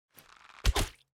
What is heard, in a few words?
bow